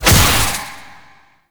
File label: Corruption Pulse; Enemies; Abilities; Warframe; Zariman; Void Angel